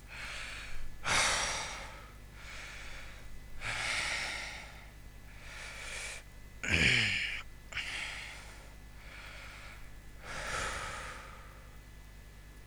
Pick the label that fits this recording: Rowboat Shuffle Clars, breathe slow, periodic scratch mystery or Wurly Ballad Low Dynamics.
breathe slow